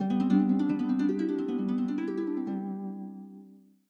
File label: victory lyre; smite; Apollo